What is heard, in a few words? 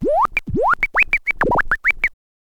scratch kit, vinyl